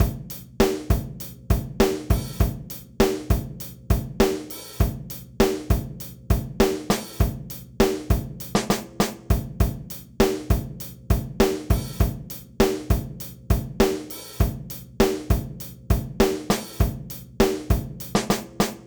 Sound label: 100bpm British Vintage Lite Stick, drumloops